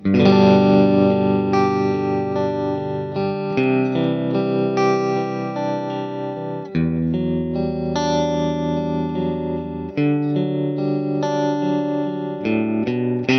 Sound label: ecc81 6l6 tremolo; uraltonejr